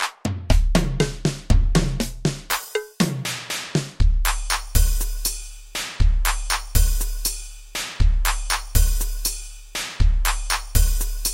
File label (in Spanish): Ritmo Hydrogen